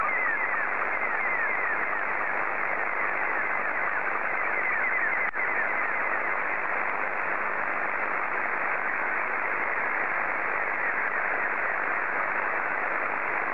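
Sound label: SSTV, Scottie 2; JA3OEN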